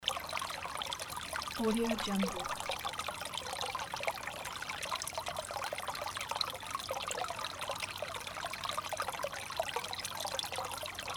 calm water stream

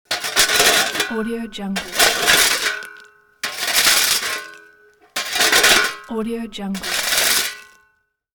shovel scrapes

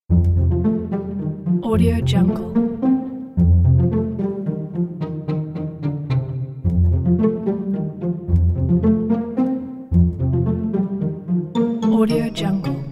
cartoon

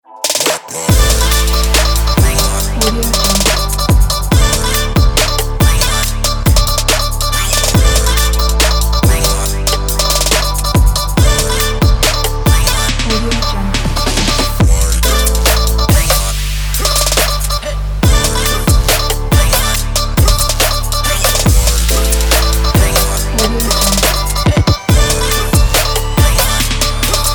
hiphop ident pack

